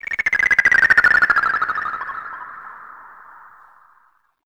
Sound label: INSECT, Special FX Twisted; USB Soundscan; AKAI